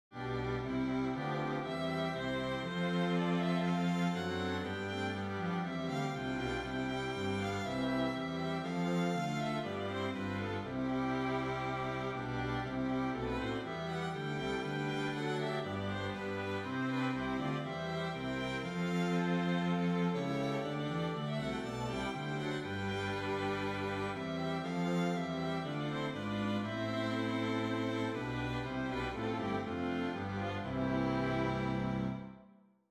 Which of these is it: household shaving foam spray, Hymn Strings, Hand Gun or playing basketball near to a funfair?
Hymn Strings